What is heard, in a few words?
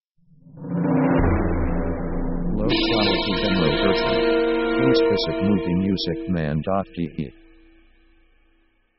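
orchestra dark hits; Dark mood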